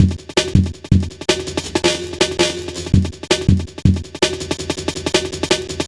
Funki, Jungle, Drum Loops 163.5; Zero-G Jungle Warfare 1, 2 & 3

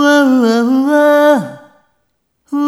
Rhythmic G

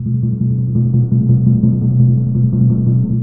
PRC TIMPRO, Timp Roll; Roland LCDP03; Timp Rol Dry

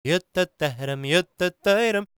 GAELIC, World Voices; ILIO; Vocal Planet

